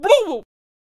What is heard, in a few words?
Potionseller Attack Vocals